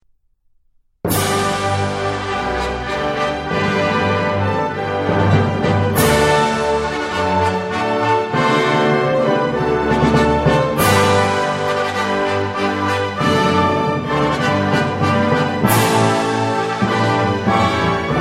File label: Latvian National Anthem (instrumental)